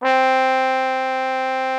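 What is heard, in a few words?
trombone C3; Greytsounds VOL-1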